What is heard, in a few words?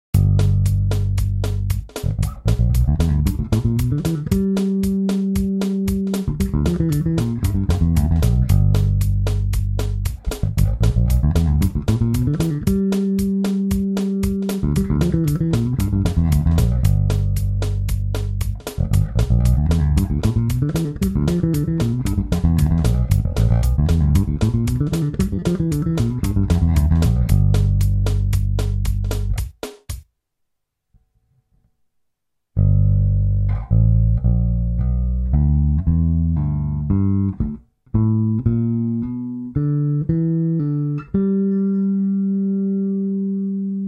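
Fast country run in G7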